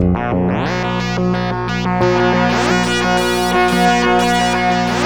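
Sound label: wave sequence, synthesizer; VFX WAVE A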